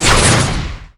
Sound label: Fast Blow